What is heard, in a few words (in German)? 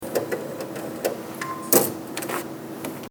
Geldautomat Karte raus